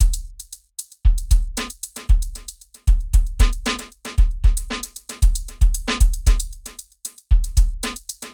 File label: Funky Drum 115bps